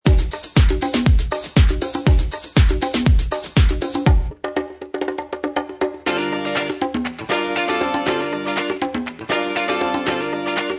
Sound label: Rock